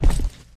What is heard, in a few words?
Footstep